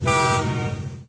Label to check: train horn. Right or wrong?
Right